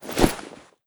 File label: crowbar deploy